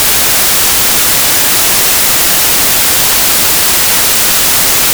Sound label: static; music; repentance